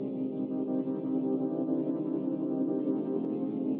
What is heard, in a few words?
Strings